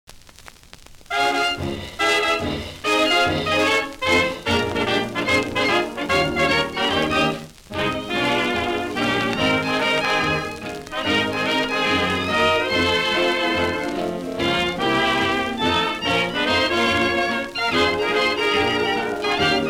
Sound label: Orchestra instrumental; Jazz; 1931; Popular Jazz Archive 1920-1936